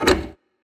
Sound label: Duct; Door